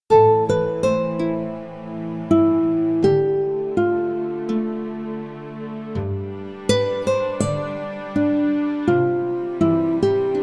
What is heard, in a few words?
amb